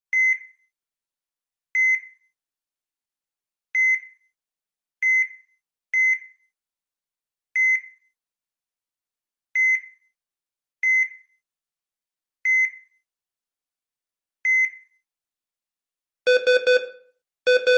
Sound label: annoying notification